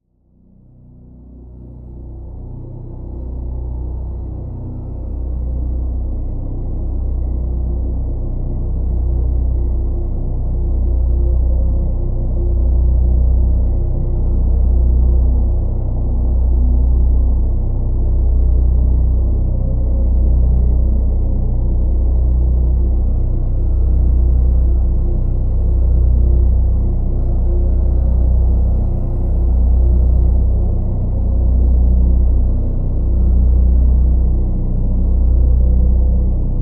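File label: Drone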